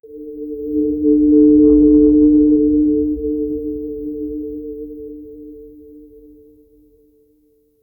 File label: a consistent harmonic sli, space